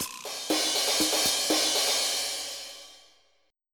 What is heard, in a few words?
Funk